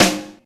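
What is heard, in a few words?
SNARE, Hip Hop; InVision Interactive; 40 Oz Phat Slammin Hip Hop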